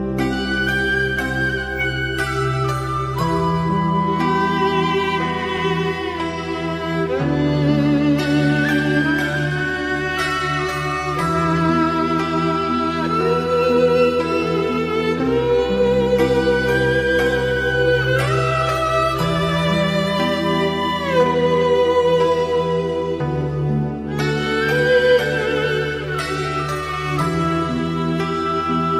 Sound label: sad violin